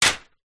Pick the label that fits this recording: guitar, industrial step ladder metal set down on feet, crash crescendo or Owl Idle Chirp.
industrial step ladder metal set down on feet